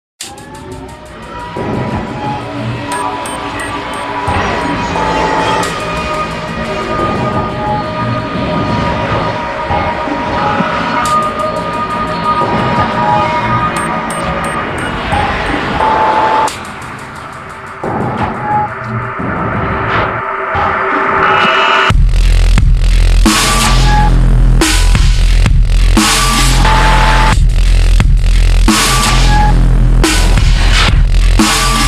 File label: demon house theme; svencoop; source of life